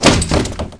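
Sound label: door close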